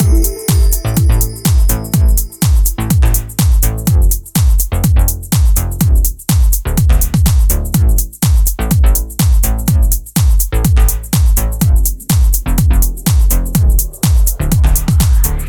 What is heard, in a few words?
House